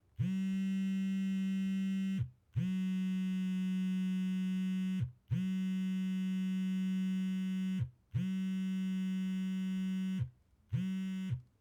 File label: Cellphone Vibration On A Wooden Box